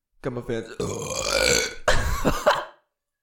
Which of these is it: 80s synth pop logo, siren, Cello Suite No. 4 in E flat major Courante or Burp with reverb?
Burp with reverb